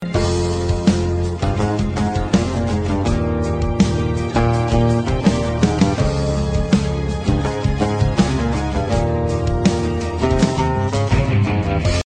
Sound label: murder 3 guitar ringtone